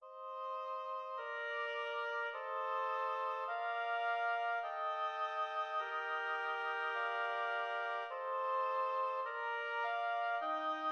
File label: oboe quartet; Brahms O Bone Jesu